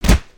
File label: Pet Cat Onhit; creatures pets